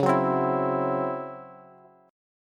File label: C sharp Minor Major 7th Double Flat 5th, Bass; 21,21,22,21